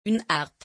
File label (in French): harpe